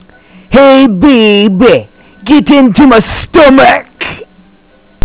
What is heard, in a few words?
baby